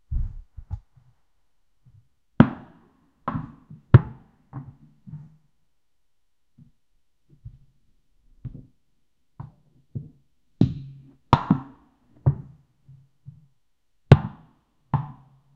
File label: sound for crazy neighbour, annoying